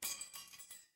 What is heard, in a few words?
glass shatter